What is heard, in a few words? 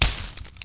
bush; Mafie 1